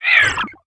System Turn Off; Xiaomi MIUI-9

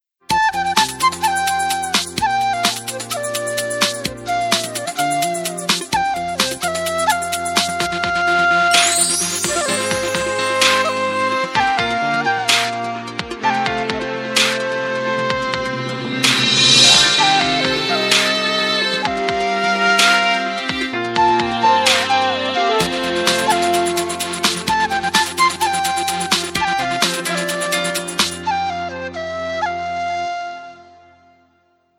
Samsung tune, phonetones

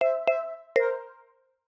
Hi There, notifications

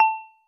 cam start, ui; LG Swift L9

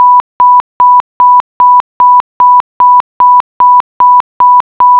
error; Asus; P835

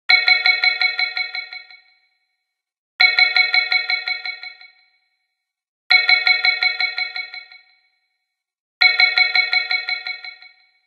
Ring Gradient; HTC Touch Pro2

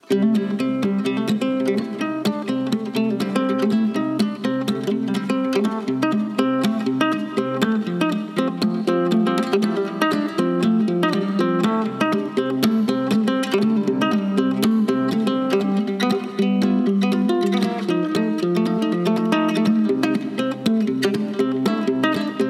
ringtone country guitar; Mudita Pure

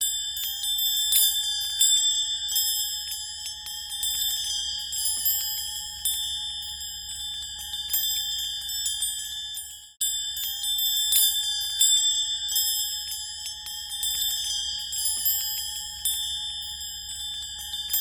call mail; HTC; Infobar-A02

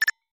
Video Record, ui

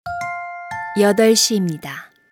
Hourly Alert

